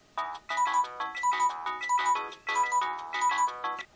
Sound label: samsung sghe700 ringtone